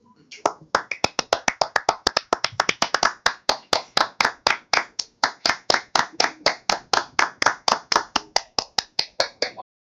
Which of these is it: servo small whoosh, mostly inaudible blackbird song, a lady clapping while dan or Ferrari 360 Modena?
a lady clapping while dan